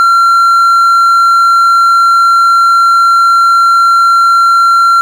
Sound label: beep; Beeps